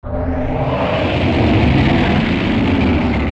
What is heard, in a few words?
Scream; King Dodongo; zelda; OOT